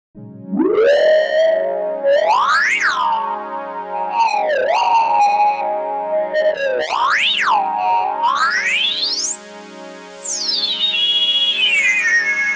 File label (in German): Filterschrei; Waldorf Kyra